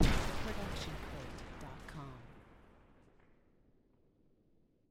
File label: Explosion Blast with Debris; SoundsCrate